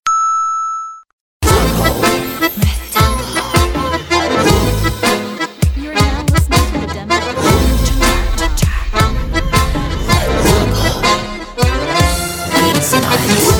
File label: Bayan Tango